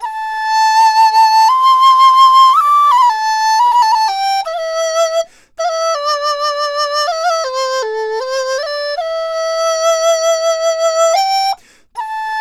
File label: DIZU, 90; INIS - Opium